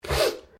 MALE SNIFF; Male Vocal sounds; Sick (Sound Effects:Human)

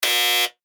Game Show Buzzer; Miscellaneous Electronic; BlastWave

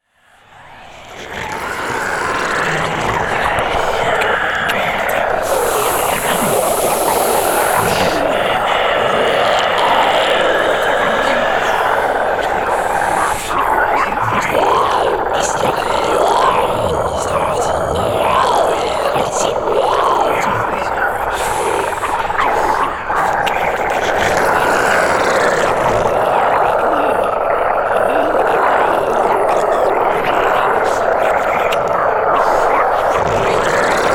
Drone Dark Demonic Growl Whispers; Sound Design; Blastwave

